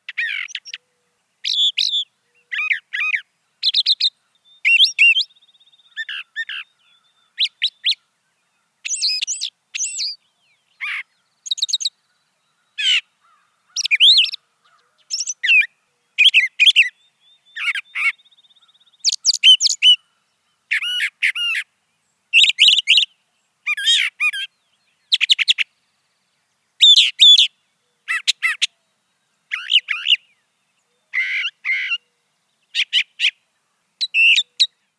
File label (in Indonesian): suara brown thrasher